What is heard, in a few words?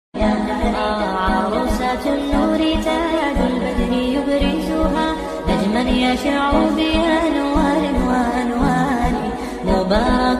islamic ringtone arabic ringtone urdu gojol naat